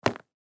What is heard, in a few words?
baseball glove catch